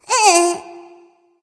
tongue; smite; ratatoskr

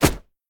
recall punch; Smite; Susano

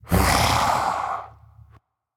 sigh; smite; voicepack; odin

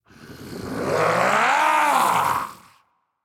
battle cry; smite; voicepack; odin

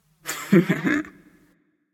hehheh; Smite; bellona

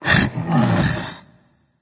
grunt lift berserker; cuchulainn

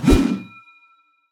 swing; smite; god sobek

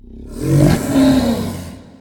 death growl, manticore; smite

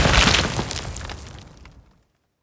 stone destroy; smite; medusa

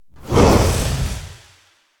recall growl; Smite; Cerberus

